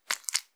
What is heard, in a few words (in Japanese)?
ぱちゅ音単発, ゆっくりめ